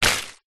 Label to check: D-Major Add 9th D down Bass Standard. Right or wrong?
Wrong